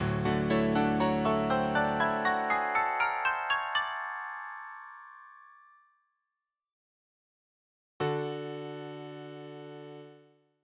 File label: overtones